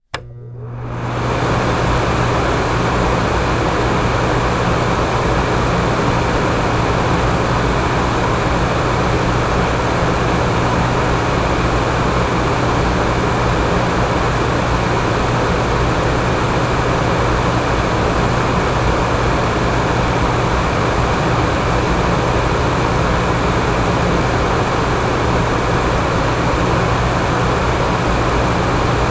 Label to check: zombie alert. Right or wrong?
Wrong